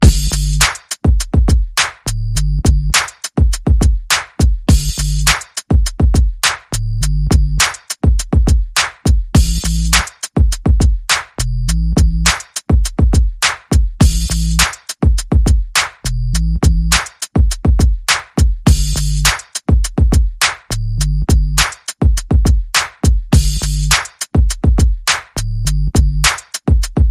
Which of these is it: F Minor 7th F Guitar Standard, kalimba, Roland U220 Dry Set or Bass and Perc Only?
Bass and Perc Only